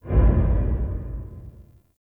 Low Mech; Warframe; Ambience; Nokko Colony

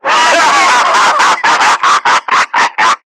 Screamer Self Destruct; Warframe; Lasrian

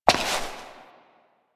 Step Rock B; Warframe; Koumei

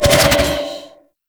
Scythe Charge Spin, Weapons; Warframe; Stalker Scythe

